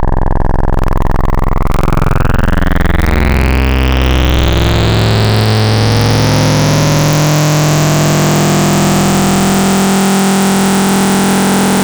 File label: PWM Res Saw Mod; Synthetic's Serum Collection; Cymatics - Nuclear for Xfer Serum Wavetables